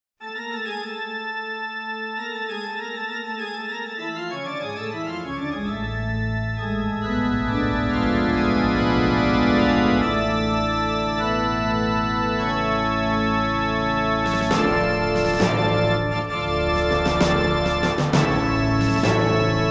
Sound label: Wedding Music